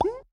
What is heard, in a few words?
Slime Vox Small